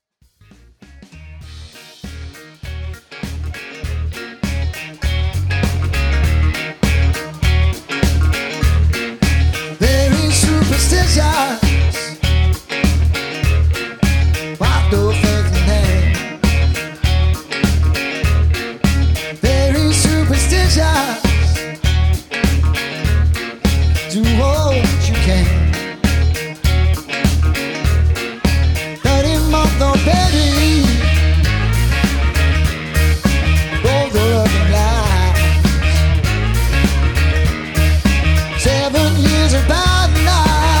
Rock, LIVE

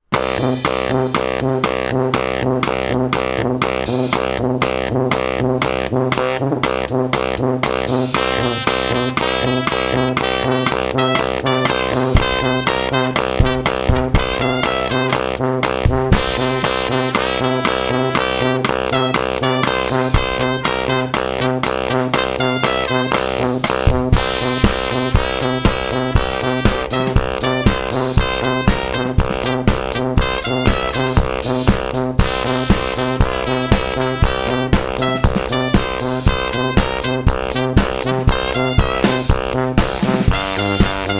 Horns

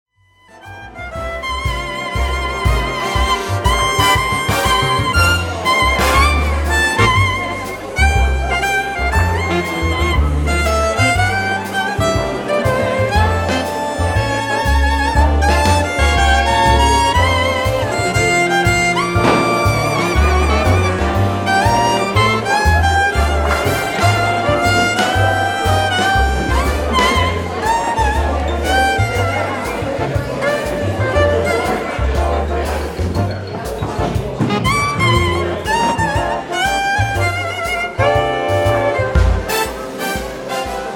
Solo Violinist Electric violnist

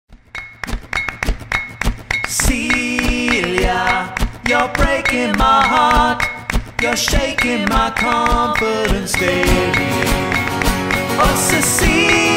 Acoustic Duo; Guilty Nostalgia Duo

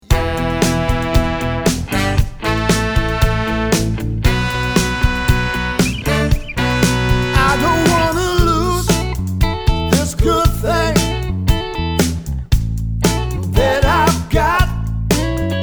Live Soul & Motown Band; The AP Band

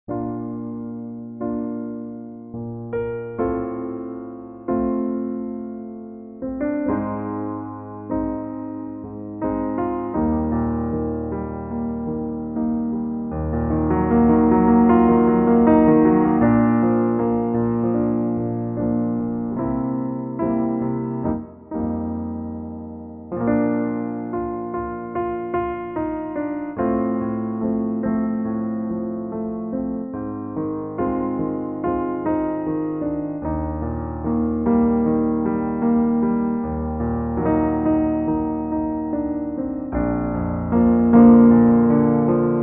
Solo Pianist

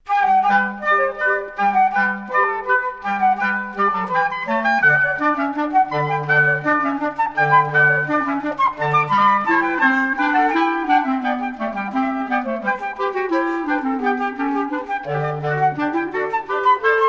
Woodwind Trio

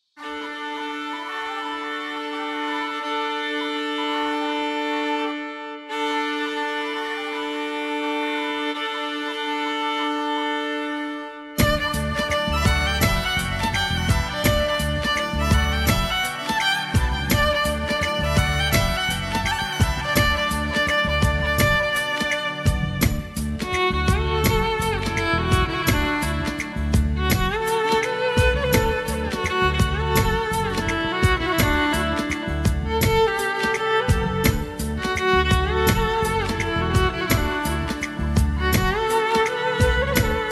Bollywood Violinist

